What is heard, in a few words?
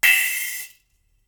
Splash, drums singles; The Roots Drumloops